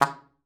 C#3, alto sax; Xsample VOL-2

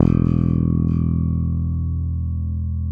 SPEC SUSTAIN, Bass

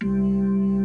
HAM C2 R, keybord

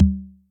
Electric Tom, Yamaha DTXpress; 300 Drum Machines

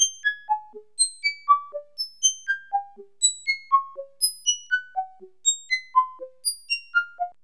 SPACY DRY

